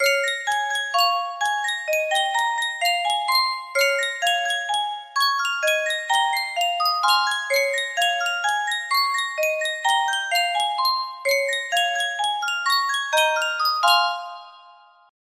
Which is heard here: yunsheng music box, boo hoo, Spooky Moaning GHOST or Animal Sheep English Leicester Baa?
yunsheng music box